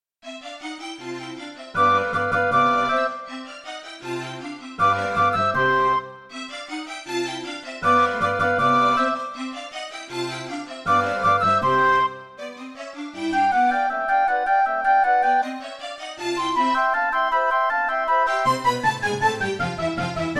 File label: Yamaha MU500; classical; GS MODE; Mozart; Concerto K467 partial